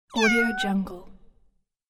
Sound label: cute cartoon cat meow